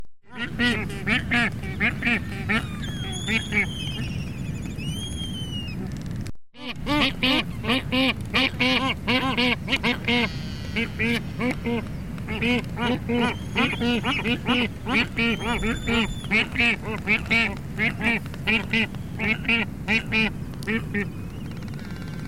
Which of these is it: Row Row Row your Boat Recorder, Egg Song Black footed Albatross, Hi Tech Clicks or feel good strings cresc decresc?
Egg Song Black footed Albatross